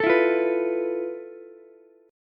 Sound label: F Major 7th Flat 5th Ukulele; strummed; JGuitar